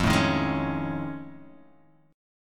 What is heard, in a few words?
F 7th Sharp 5th Sharp 9th F; F7#5#9 strummed